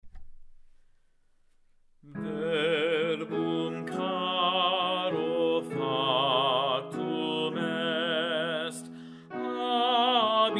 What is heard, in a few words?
Bass; Verbum Caro Factum Est, Anon.